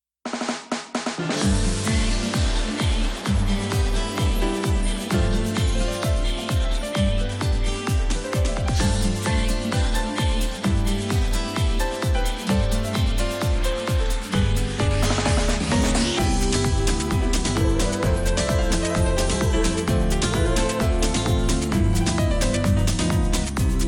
[F] Singing; from Yakuza Kiwami; Japanese

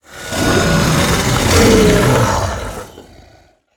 Monster; Zenless Zone Zero

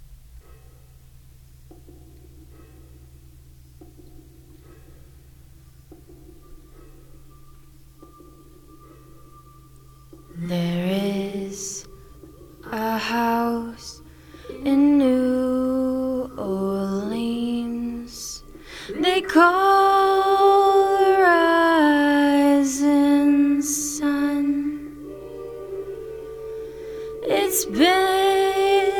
House of the Rising Sun, cover, singing

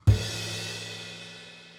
drum; Pony Fan Music Remix Stems

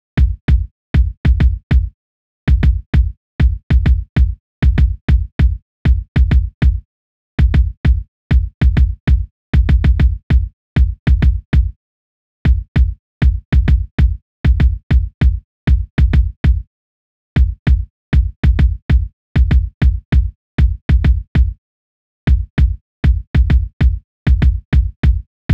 The Superpower instrumental Kick

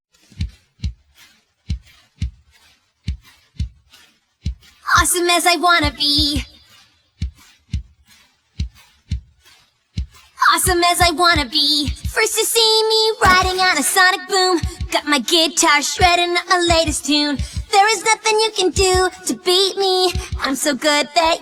(Acapella without Backing Vocals)

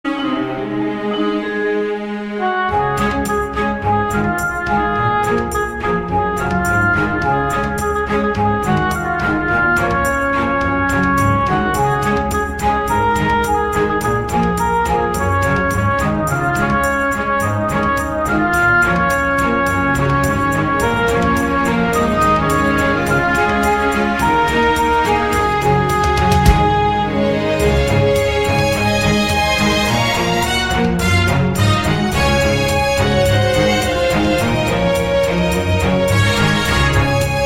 Orchestral Remix

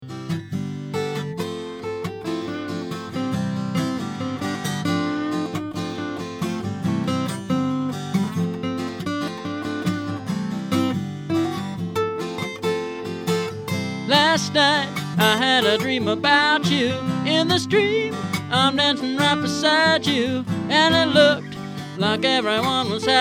acoustic

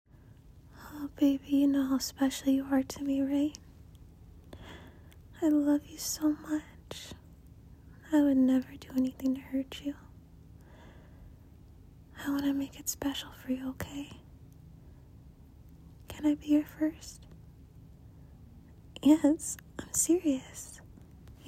F4M, female moaning, wet noises; virgin; JOI; Can I be your first

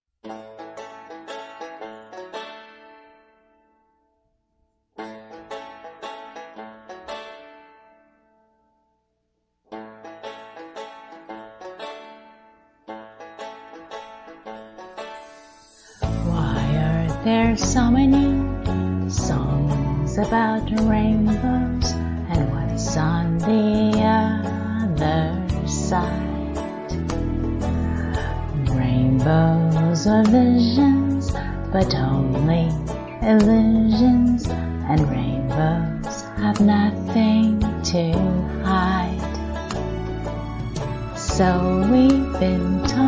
karaoke duet

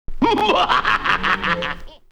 Evil laugh; Pony Fan Music Remix Stems; 140BPM

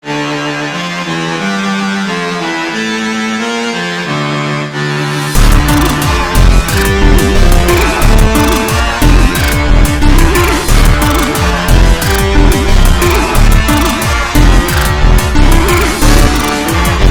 Cello dubstep attempt

